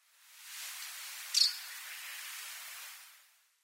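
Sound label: Common House Martin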